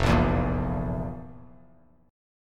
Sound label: F Major 7th Suspended 4th F, Bass Standard; FM7sus4 strummed; JGuitar